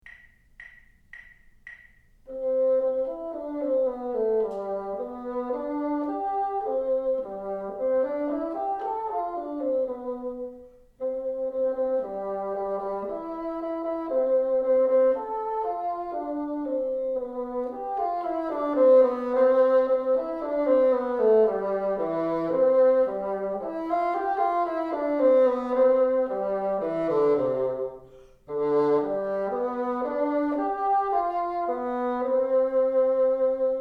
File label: teacher, bassoon